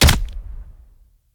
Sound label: brandish sabre